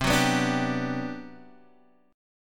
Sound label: G Major 7th, C; strummed; JGuitar